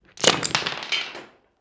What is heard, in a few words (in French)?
chute cubes